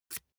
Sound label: object fire match light fail; smartsoundfx